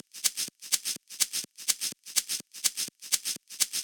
Shaker; techhouse

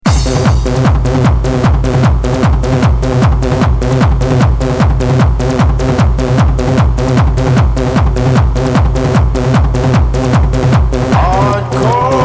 Dancefloor Hardcore

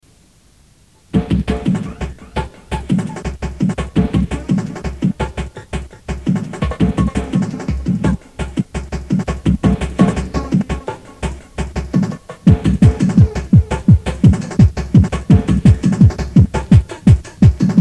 happy breakbeat

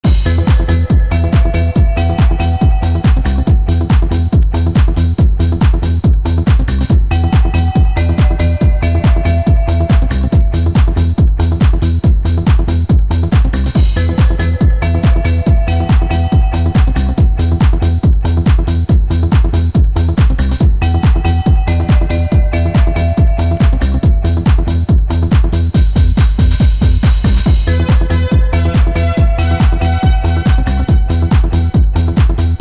trance

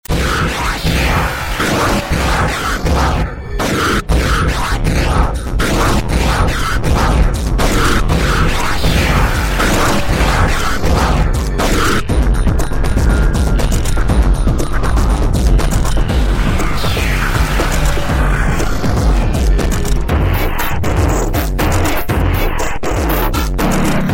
Noize Loops; experimental

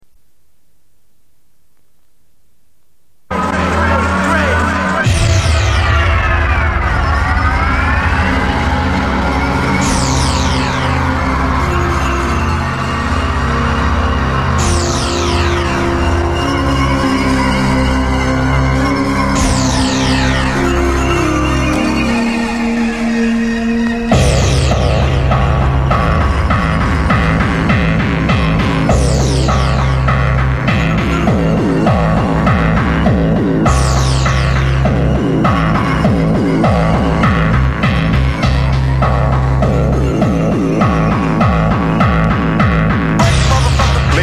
hardcore mix

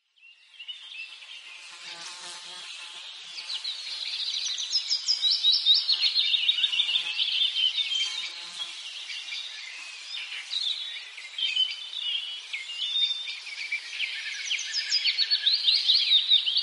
The sounds of spring bird songs

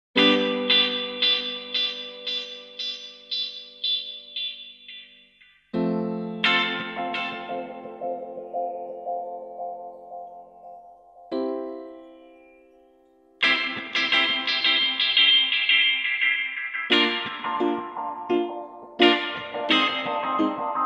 Sirens

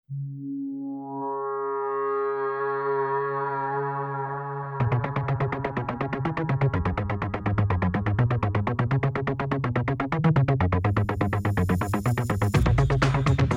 Trance

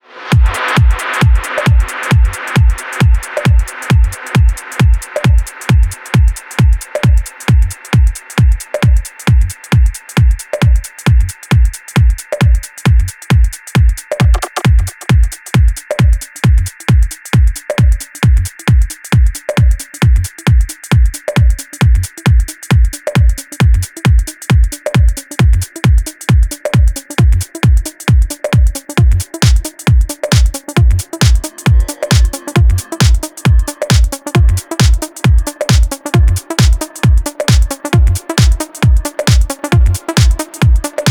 Acid House

